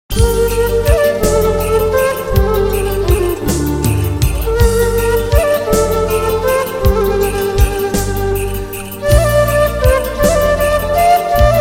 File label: Meditation; Inka